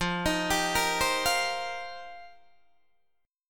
F 9th Suspended 4th F Guitar Open D, chord

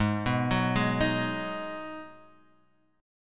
Ab Minor 7th, down, Guitar, Standard; arpeggiated

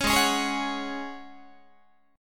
Ab 7th Suspended 4th Ab down Guitar

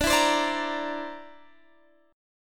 D 7th Suspended 2nd Sharp 5th D

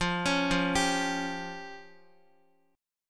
F Suspended 2nd Sharp 5th F down Guitar; Fsus2#5 arpeggiated

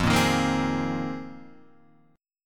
A sharp 9th Suspended 4th F, guitar

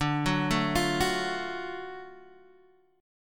F sharp Minor Major 7th Sharp 5th D

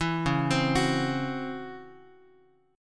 Db Minor Major 11th Db, Bass Standard; arpeggiated; JGuitar

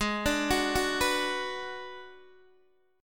G sharp Minor 7th Flat 5th, Guitar DADGAD; x,11,12,11,9,9

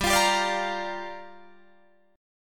G Minor 7th, Guitar, Drop D

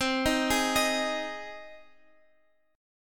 C Minor Sharp 5th C 4G,5C,5E,5A

